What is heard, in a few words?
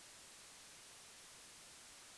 noise; quantization